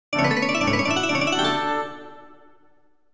Level Up; Might and Magic 2

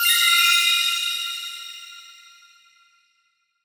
Duel Entry Coin Head

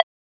metal detector

